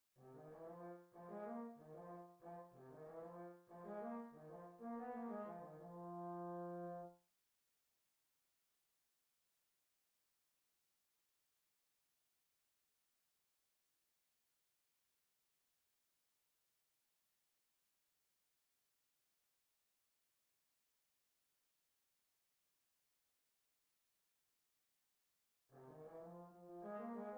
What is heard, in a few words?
Trombone; OSS 117